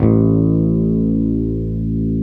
JAZZ BASS, A1

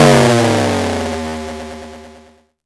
effects, drum machines, FutureRetro FR777

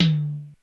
Hi Tom, Korg DSS-1 Drums

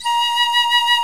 piccolo

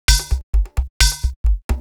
Percussion, Trance NRG, Drums; Sonic Foundry (Sony Creative Software); Trance NRG VOL-2

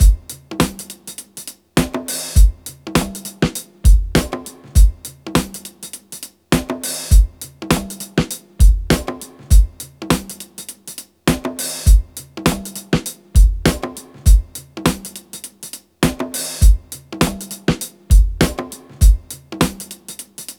101 Overbounce, breaks; Lockdown Breaks

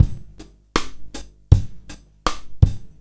Live Beats, R&B Drums

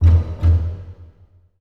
STRINGACCENT, Orchestral Loops; Best Service ProSamples vol.33; AKAI

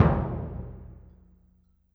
Bass Drum, Orchestral Percussion One Shots; Sonic Foundry (Sony Creative Software); Orchestral VOL-2

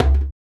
SUNU, African Perc; Spectrasonics; Supreme Beats; African Contemporary; Afro DRMs

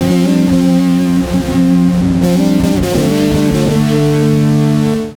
Bass FX; Basses

